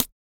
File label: HIHAT, MALE PERC; Spectrasonics Vocal Planet; Jazz FX